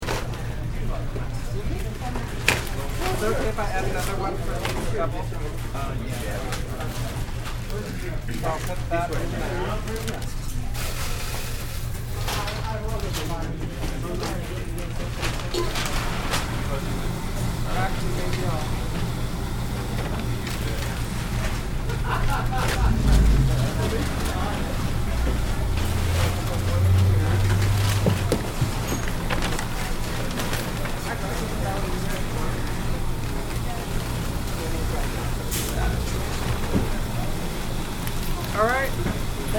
Grocery Store Interior Light Crowd Near Checkouts Long Version; Stores And Mall Sound Effects; Urban Space